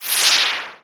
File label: Cry